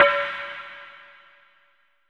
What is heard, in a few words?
HIT NOIS; AKAI S-Series CD-ROM Sound Library VOL-5